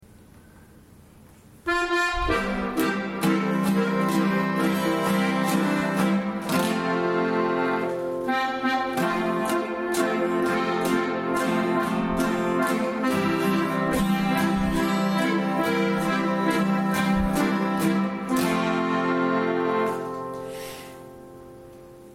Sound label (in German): Faschingslied instrumental; Zeutermer